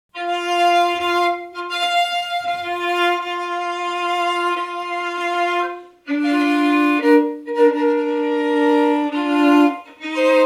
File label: Bridal Chorus